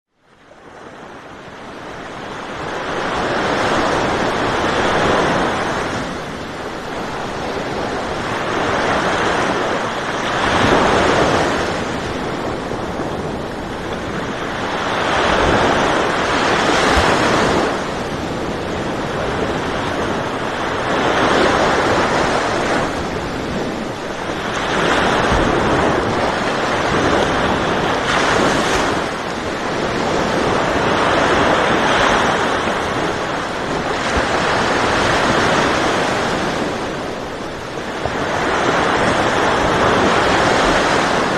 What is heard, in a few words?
ocean sleep low